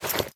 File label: equip chain, armor; Minecraft